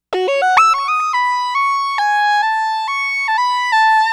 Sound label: Keyboard